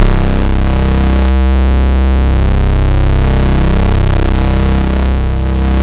C1, Synth Line; 90 s Sample CDs; NiceBeats; SynthLine VOL-21

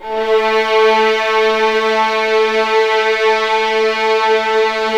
A3 LEG FVL L, String; East West; The Ultimate String Collection

